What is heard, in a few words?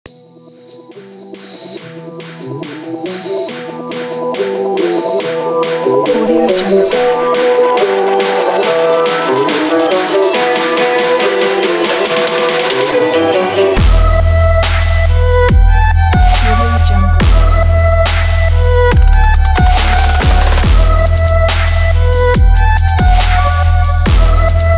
middle east trap hip hop